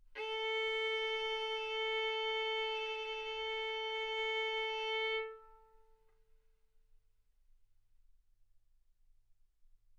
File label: Violin arco A4 B4